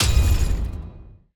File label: Armor Break; TANK; 3Souls